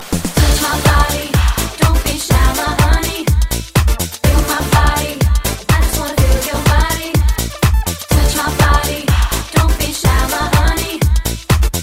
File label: party song tone ringtone, english ringtones